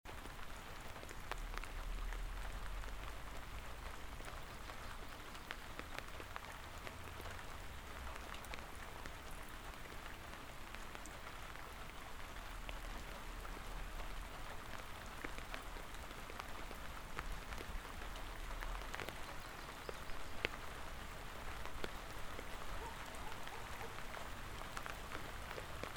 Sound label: Thunderstorm; Sutherlin OR